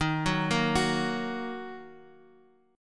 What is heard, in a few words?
D sharp Minor; D#m; arpeggiated; 11,9,8,11,x,x; chord shape